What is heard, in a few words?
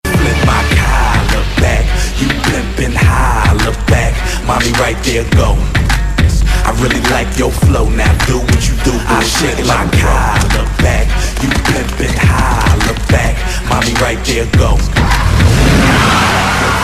Bgm